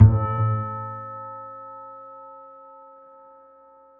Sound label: Plonk Vine Soft